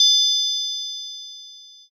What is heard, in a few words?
triangle